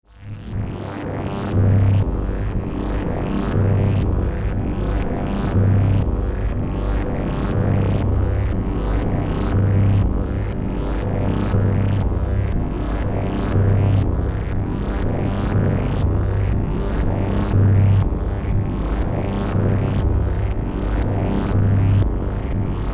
Throbbing forcefield; Tech (Sound Effects:Science Fiction)

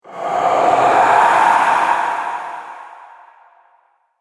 Pyramid Phantom, ghost sound effects

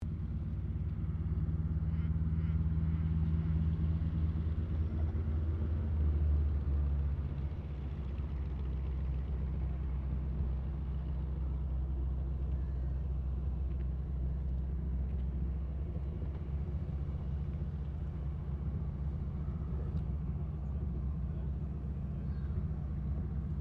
AIRCRAFT AT 6D HARVARD MK III TAXI SHUTDOWN; Harvard Training Aricraft; Airplanes

